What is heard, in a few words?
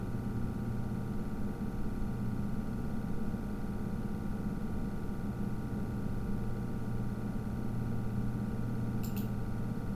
1000 RPM